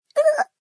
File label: entity dead; croods